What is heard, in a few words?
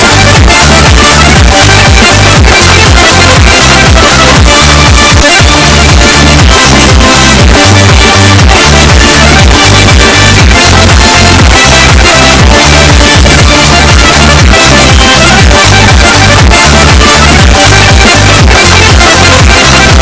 music